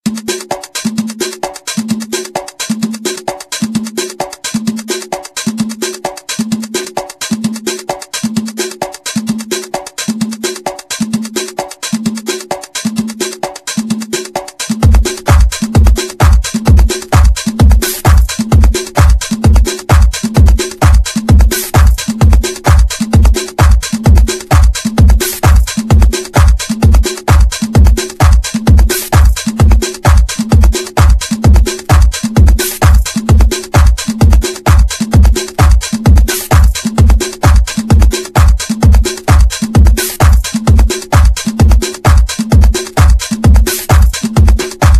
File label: saxbeat